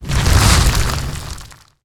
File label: Rumbling Elemental Attack